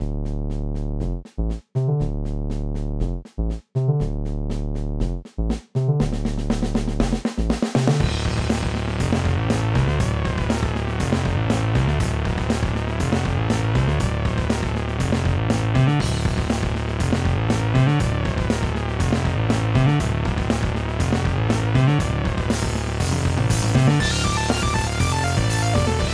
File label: full theme, Boss Music Loop